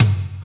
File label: old school high floor tom drum sound g sharp